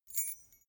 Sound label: key ring small fly tinkle